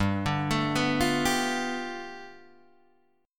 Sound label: G 9th, Guitar Open D